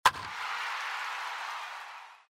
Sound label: dice